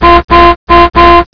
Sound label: alarm; quake2